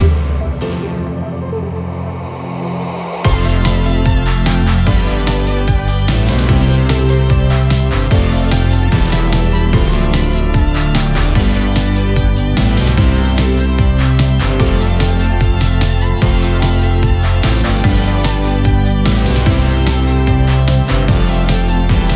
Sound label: instrumental